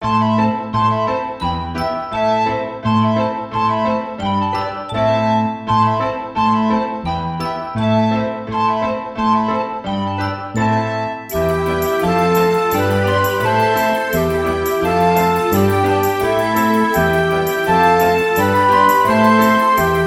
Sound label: Celesta